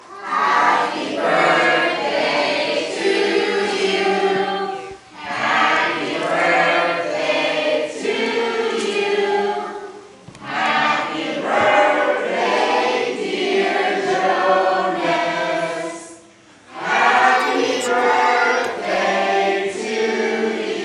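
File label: Happy Birthday Song Clap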